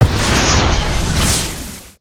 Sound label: fireball; creatures organic